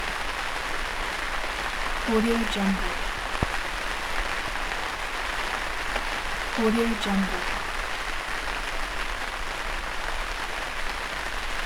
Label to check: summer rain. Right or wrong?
Right